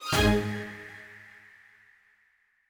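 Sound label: Jingle Item Get Smoothie; Ring Fit Adventure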